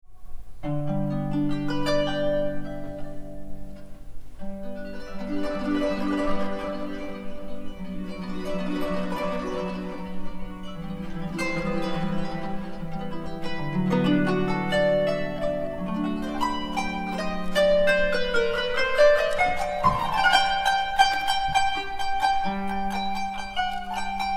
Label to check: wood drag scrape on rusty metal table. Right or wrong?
Wrong